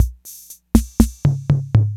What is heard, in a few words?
INTROS FILL, DRUMS PERC YAMAHA